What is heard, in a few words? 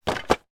game board put in box; scrabble